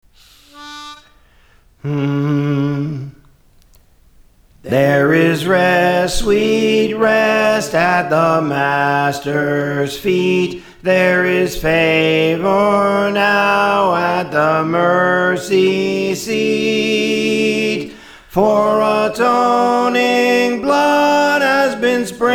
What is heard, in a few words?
Soprano; There Is Rest Sweet Rest; Praise For The Lord Training